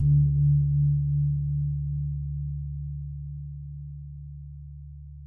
gong

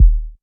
Hard Subby Kick

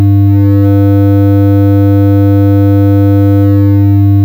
Mining laser

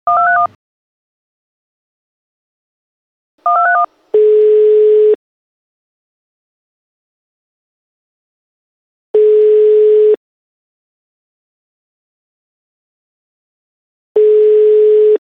Phone Calling

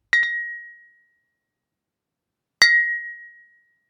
Clanking Bottles

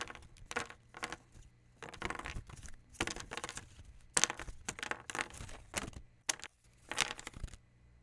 Card shuffles

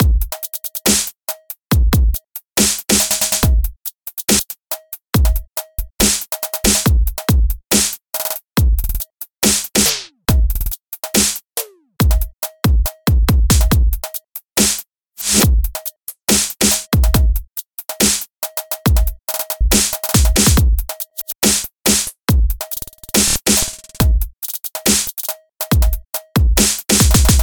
Glitch drum loop 140bpm

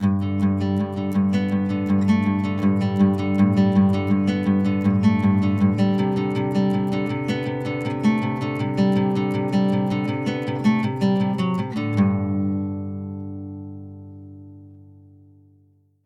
Acoustic guitar Picked 160bpm G C

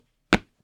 Big Step

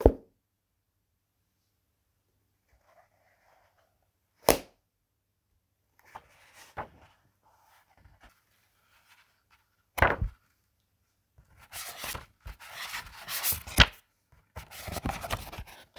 Book Page Turning

